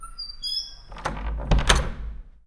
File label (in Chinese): 关第一种门; 环境音; 非循环音(SE); Eustia